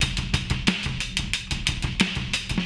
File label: Process Beat, Hip-Hop Beats